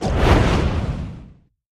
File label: Dragon Fire Whooshes; No Crackle Element X 10